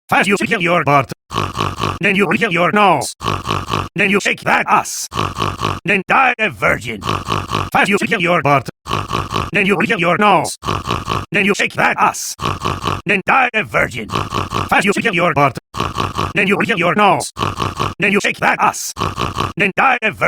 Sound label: SNORT SNORT SNORT (Acapella)